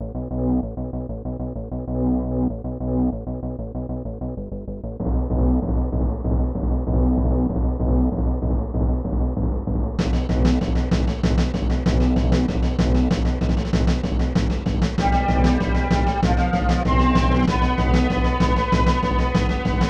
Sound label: Boss Battle; Boss Battle with Ocean God